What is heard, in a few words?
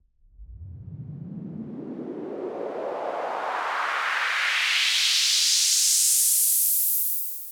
Raise Clean